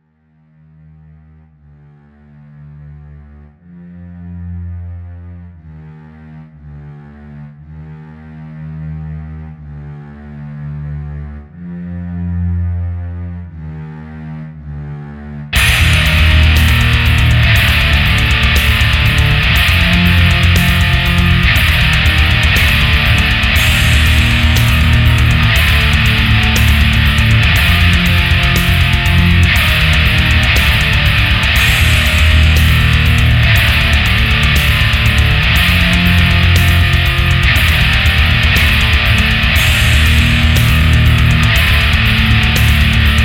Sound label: drum